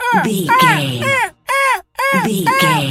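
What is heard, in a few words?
seagull squawk seq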